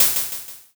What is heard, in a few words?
open hat